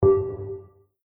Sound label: pulse